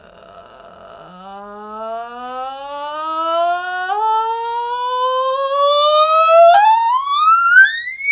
Glissando

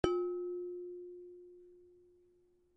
bell plate other node fundamental mode; bellplates

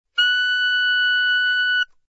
G sharp 6, soprano saxophone

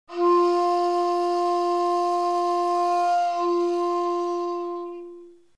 Tsu, shakuhachi; flute; played by Riley Lee

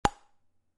bellplate corner; bellplates

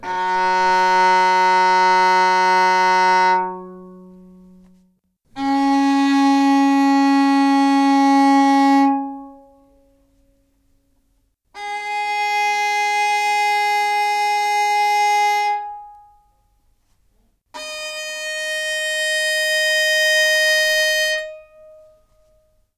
Open Strings Baroque Bow Gut C

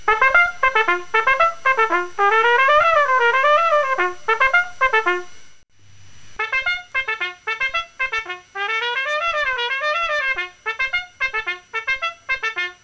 compare mute, brass

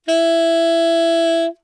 F sharp 5, saxophone tenor

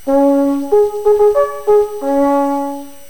110 cm bell, brass

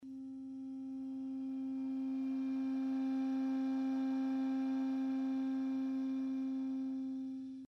D4 covered, clarinet

